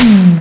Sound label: TOM ELECT; USB Soundscan vol.27; Ragga & Dub Reggae; AKAI